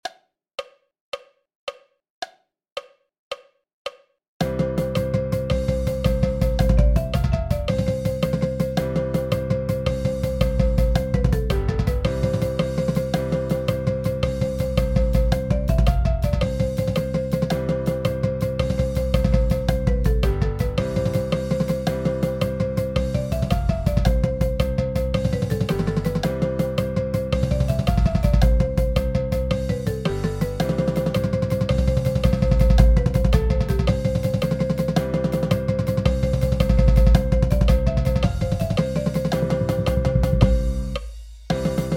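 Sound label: 110 BPM Cheesy Rolls with countoff, Marching Percussion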